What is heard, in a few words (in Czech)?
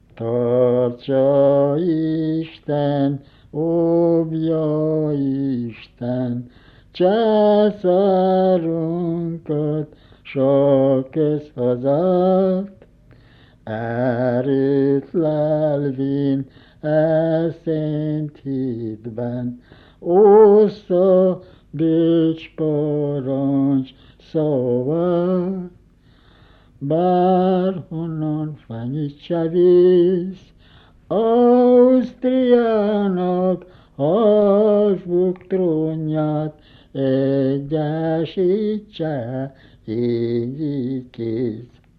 hymna madarsky